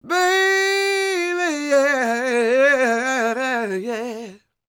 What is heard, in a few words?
SOUL, Vocal Planet, Blues & Soul; CW SOUL